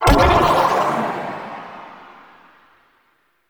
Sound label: Anima Dmg Reduc Aura Burst; Warframe; Anima Animus